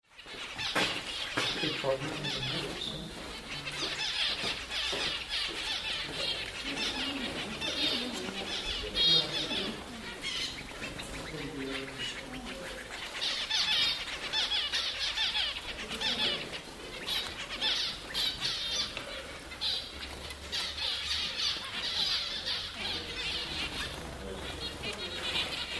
zebra finches; stonham barns

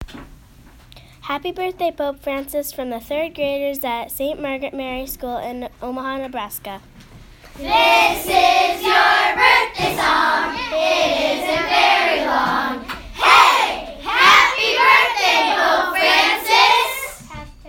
happy birthday, sang you birthday greetings; pope francis

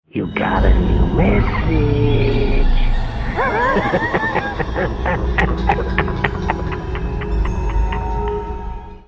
message alert

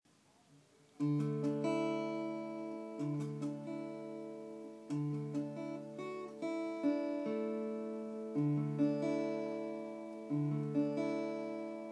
guitar

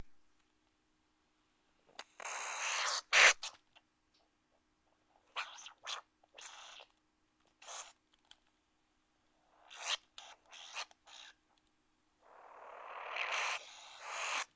solo dictaphone improvisation

